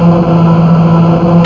ENGINE; wreckage

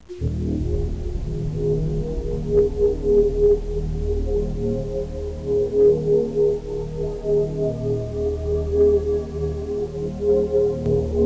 ambience, Clashing Rocks; Wrath

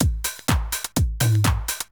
drum loop house 125; eJay

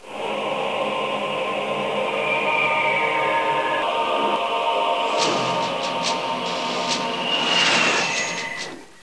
Windows Start

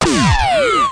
Magic; duke nukem total meltdown

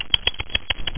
SQUIRREL; Gold Medal Software - Volume 3 (Gold Medal) (1994); butter

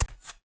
foot; PC Gamer IT CD 45; SMANDEMO; baddys

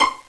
pizz c6; Hexen Music; Hexen 6vox

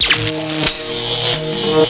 Power Spark; warzone

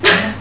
pah

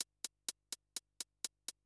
HIHAT HOUSE 125; eJay; Drum

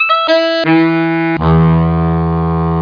SAX

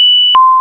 ALARM

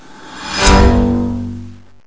reset; jewel

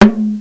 tomtom

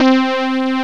Lead 3 (C3); X-Wing; X-Wing Patches

